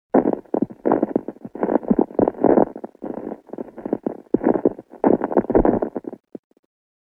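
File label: walking on snow Texture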